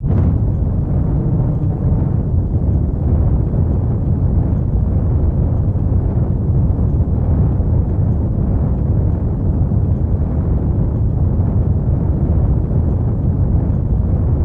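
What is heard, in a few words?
hot blow, firestorm; Total Control - Action Cutz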